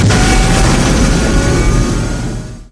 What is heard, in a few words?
warp enter; Earth and Beyond; EA Games